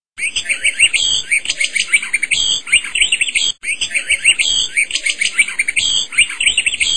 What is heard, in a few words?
birdsong